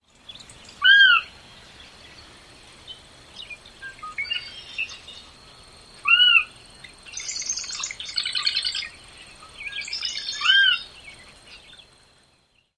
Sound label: Sora, nasal feeding call